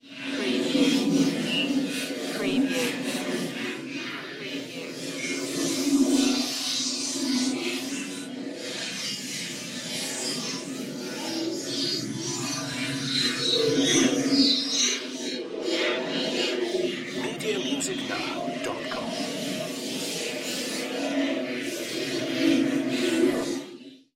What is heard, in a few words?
intermediate world ambience